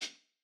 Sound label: Jazz hop hihat